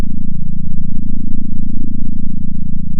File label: pop, sound of a small motorcycle